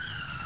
skid; xmr

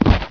put down; TNPDEMO

